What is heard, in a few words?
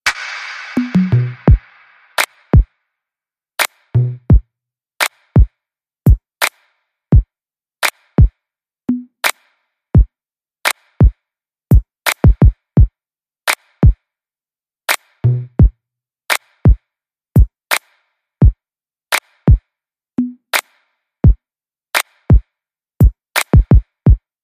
Beat